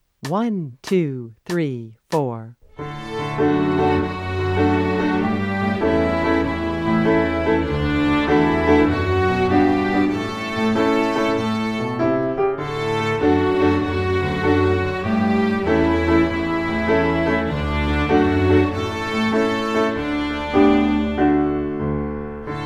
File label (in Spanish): Remando suavemente, violin